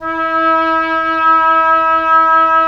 Oboe; Roland - LA Composer vol. 4

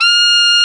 SAX XALTOS, Sax Sect.; Saxophones

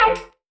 orgharm mid; Sonic Foundry (Sony Creative Software); Synchro Funk; One Shot Sonics

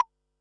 Perc, Percussion and FX; Pearl SC-40

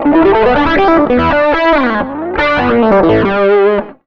SOLO L, Electric & Acoustic Guitar Loops

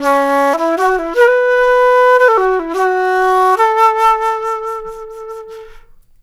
Flute, Jazz; Flute Loops; Sonic Foundry (Sony Creative Software)

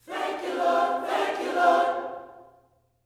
THANK U, Gospel; Spectrasonics; GOSP PHR

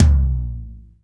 TOM D, Drum Dry; East Collexion; Drum 1

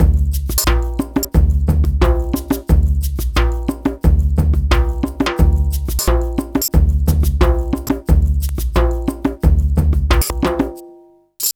Modern Percussion Loops, MPC60; Soundscan vol.56; AKAI

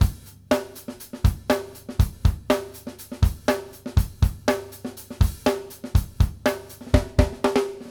BASIC C R, Drumworks

